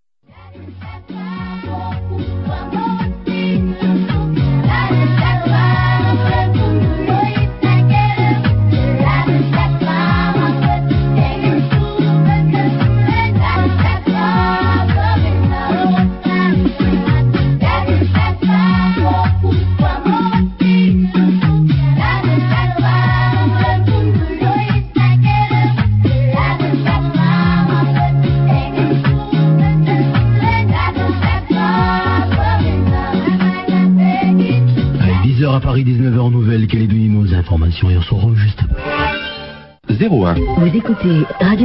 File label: interval signal; radio new caledonia